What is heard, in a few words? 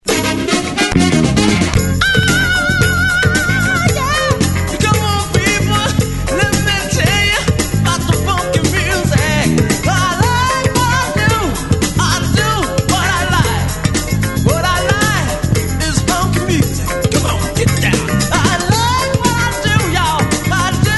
dynamic funky